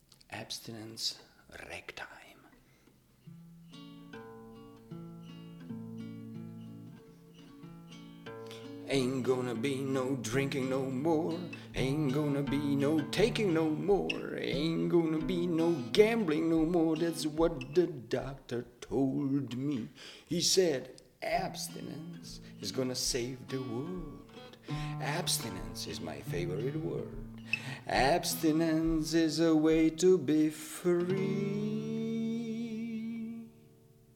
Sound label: ragtime